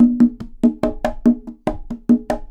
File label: Percussionworks; Sampleheads; NYC Percussionworks; 90 s Sample CDs; LOOPS 96